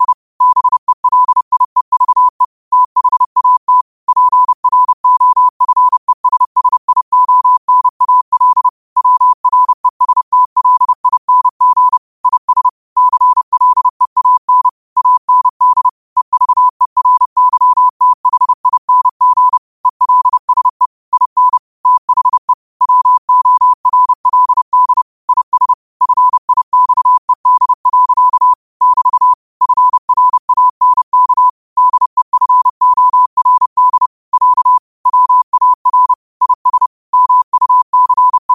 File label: 5 wpm, morse; cw; citas